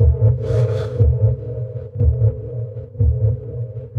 ui loading

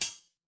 impact metal hit

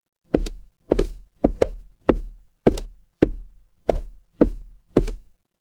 footsteps wood

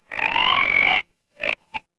animal frog

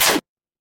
retro game laser

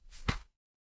paper fabric page turn

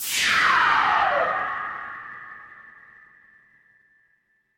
magic scifi laser

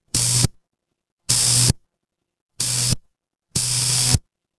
fire electric zap

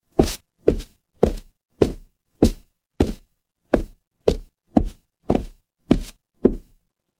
footsteps wood

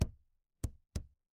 impact bounce

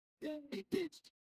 feedback confirm

